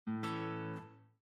Balloon; Heritage

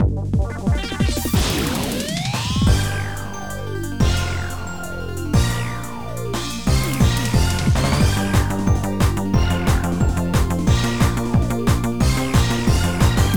Para-Para Stage Karaoke; pinky street kira kira music hour pop princess gamerip

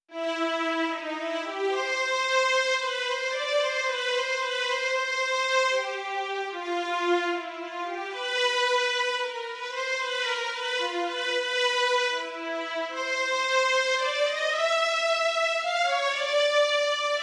Underwater; Super Mario Bros.

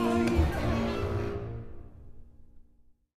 Opera Ambience; Ending 2; Assassin's Creed III

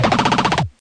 hard robot boss; gonner linux macos ps4 switch windows xbox one gamerip 2016

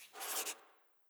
small drawing

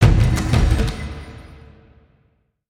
Siege Field Drums; Ninjago Monastery; lego universe macos windows gamerip 2010

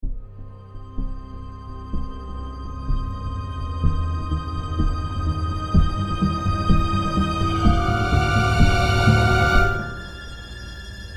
Suspense

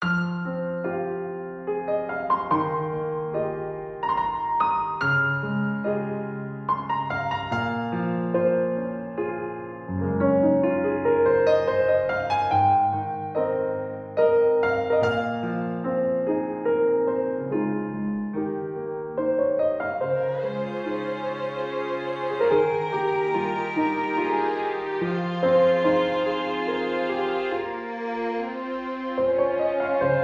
Emotional Theme Loop

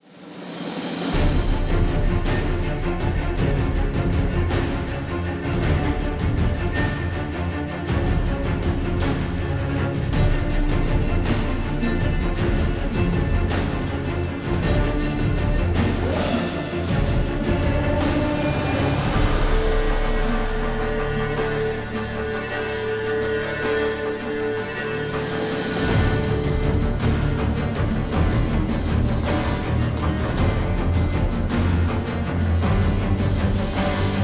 Auction House Combat Loop

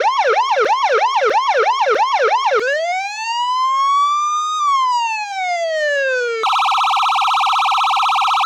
Swat sirene new

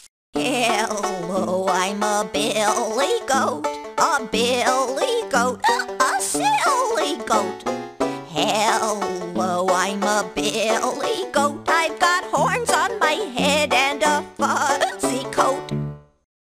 Goat Song

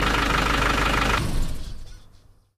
Laundromat Motor; doodle jump 2; android

